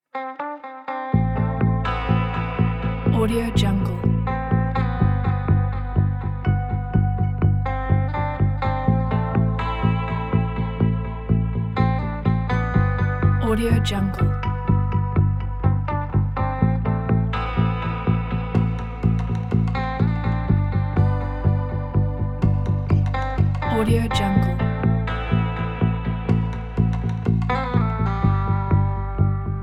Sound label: sad electronic with guitar